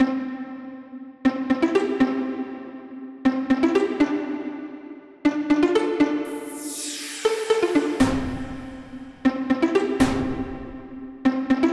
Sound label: Plucky Beat